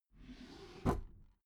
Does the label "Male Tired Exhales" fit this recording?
No